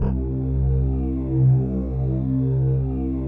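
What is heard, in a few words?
PAD, atmospheric pads; Best Service; XX Large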